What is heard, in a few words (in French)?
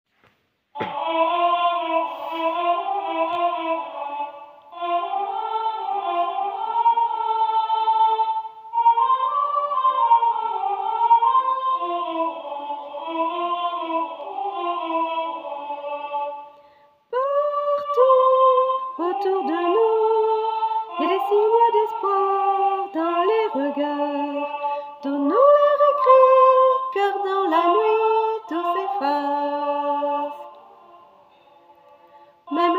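chant soprano, choeur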